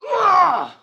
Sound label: man injured grunt; soundscrate